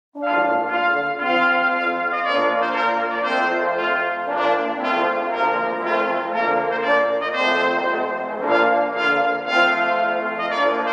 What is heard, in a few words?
Trumpet tune; Henry Purcell